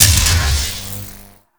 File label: Alt Orb Zap; Warframe; Tenno; Grimoire